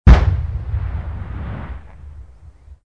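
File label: explosion distant; modern warfare 2; amb elements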